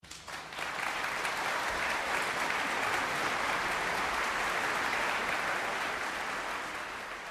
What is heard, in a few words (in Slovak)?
Potlesk